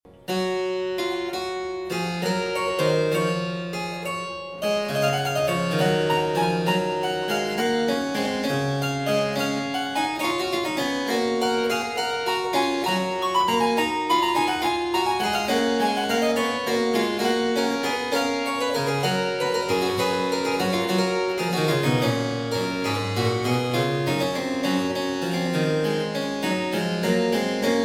Goldberg Variations, BWV 988 Variatio 24. Canone all'Ottava. a 1 Clav; J.S.Bach